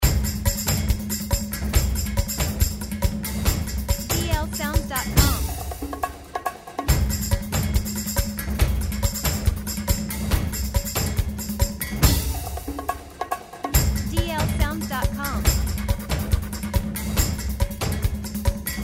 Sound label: Action Percussion Loop